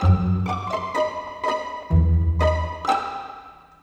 Strings Pizz; Sonic Foundry (Sony Creative Software); Orchestral VOL-2; Modern 35

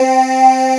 Choir Wave C3; Proteus 2000; Digital Sound Factory Proteus 2000

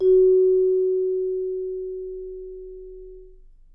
F#4, Percussion & Harp; Miroslav Vitous

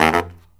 Killer Brass Riffs

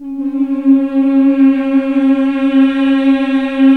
MMH C#2, CLASSIC VOX

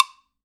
WOODBLOCK; Sonic Reality; Interactive Drum Kits

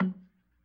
F#1 PERC, B3

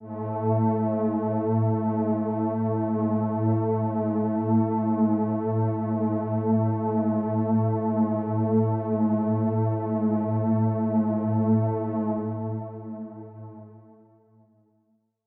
One Shot Drone A, dystopian drone; One-Shot Drones

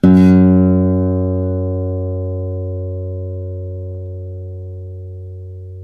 ACC FG F#1, ACOUSTIC, Guitar; East West; Steve Stevens Guitar Samples Collection

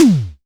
Disco Tom - Med; Tama TS-206

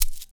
PRC SHAKE UP, Shakers; Roland - Rhythm Section; Latin 2

